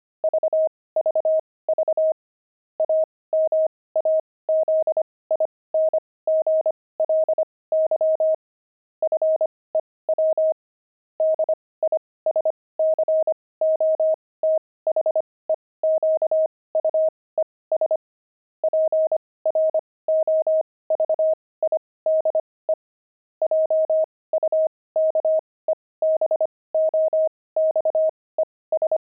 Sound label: pangram disco; Intermediate Pangrams 25cpm